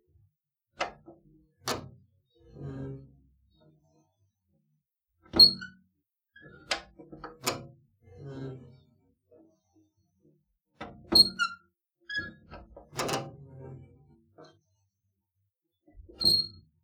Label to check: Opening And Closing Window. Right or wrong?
Right